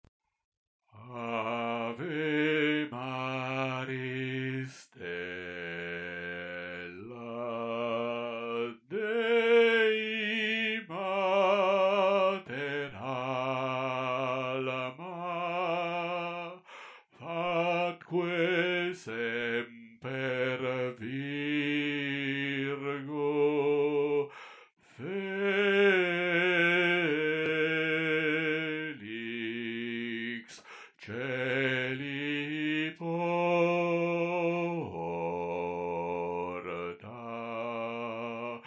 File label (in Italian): Ave maris stella - Bassi; Perosi